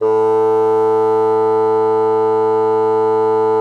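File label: bassoon forte A3; Xsample VOL-6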